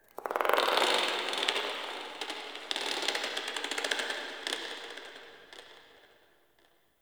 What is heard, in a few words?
Covered Vine Area Creak; Ambience; Misc Outdoor; Warframe; Infested Eris